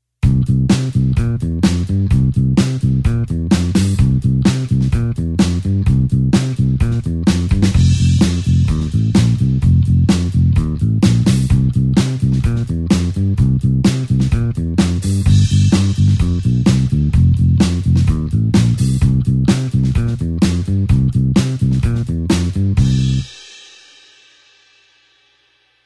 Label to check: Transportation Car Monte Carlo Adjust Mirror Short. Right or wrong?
Wrong